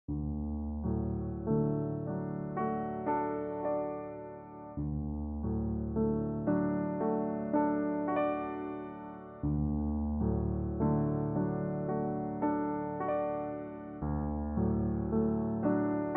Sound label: Piano play